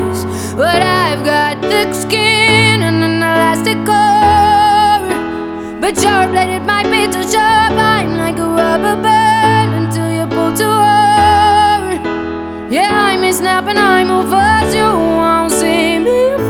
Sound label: Piano Version